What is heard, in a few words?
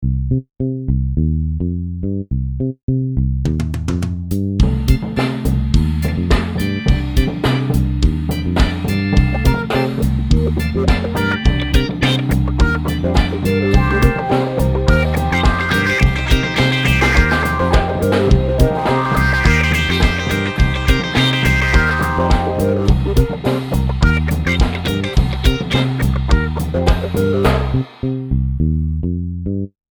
Funk mix